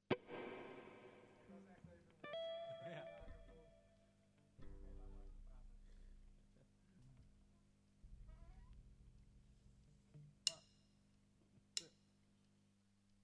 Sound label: Groove 92BPM Em7; Mfly Music